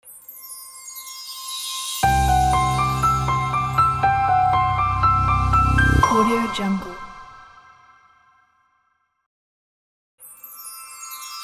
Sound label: piano ident